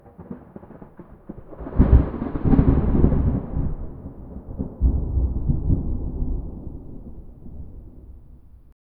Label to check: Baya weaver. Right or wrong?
Wrong